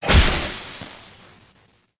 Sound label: idle electricity explode; smite; god merlin